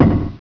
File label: canon; hurrican